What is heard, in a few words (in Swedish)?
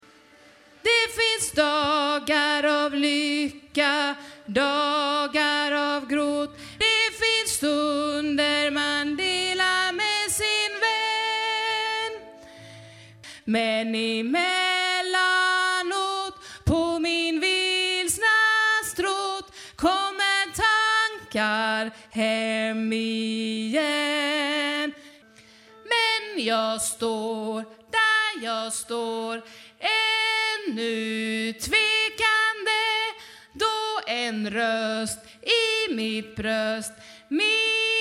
bas